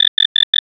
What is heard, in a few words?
Alarm